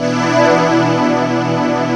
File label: HOUCEY CHORD